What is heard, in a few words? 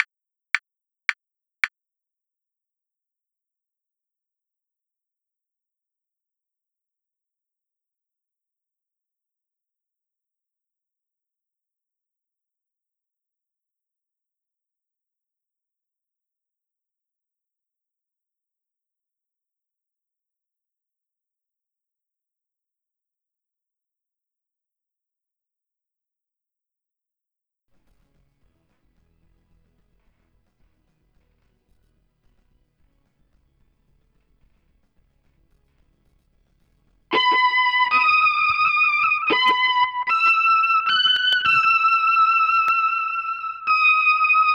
melody after 1st noise part